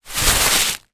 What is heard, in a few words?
plastic bin trash liner bag screw up in hand; foley; zapsplat